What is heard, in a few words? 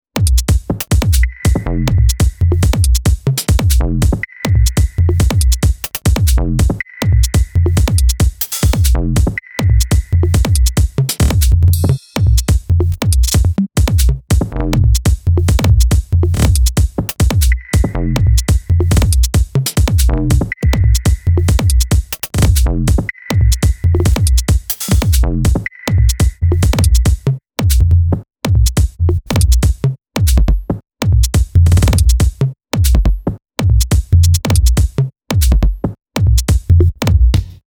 Busy Complicated Percussion only